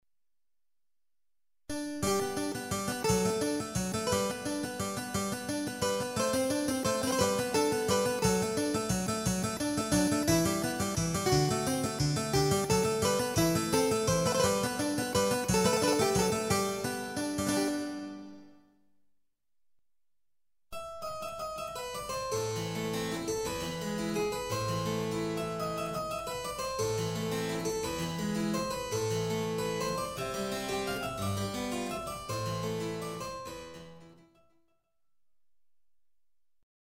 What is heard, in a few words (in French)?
clavecin